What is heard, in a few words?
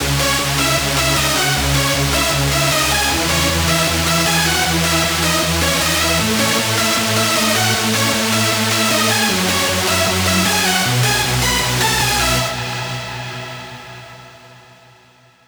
lead loop C#min 155; Music Loops; GhostHack